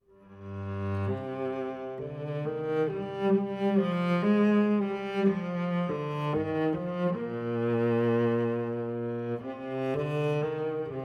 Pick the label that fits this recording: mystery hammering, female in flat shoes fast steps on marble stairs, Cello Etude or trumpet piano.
Cello Etude